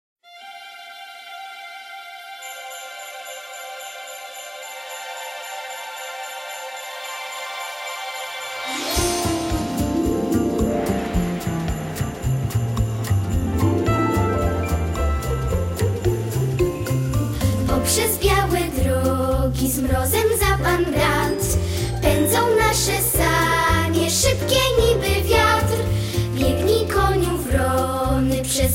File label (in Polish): Pada śnieg Jingle bells